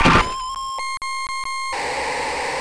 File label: die; clonecop